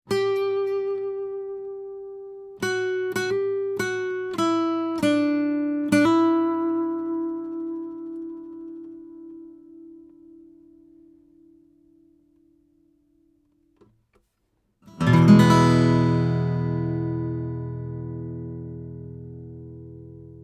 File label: line audio cm3 gitarre